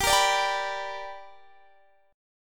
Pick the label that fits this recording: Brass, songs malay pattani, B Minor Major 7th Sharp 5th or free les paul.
B Minor Major 7th Sharp 5th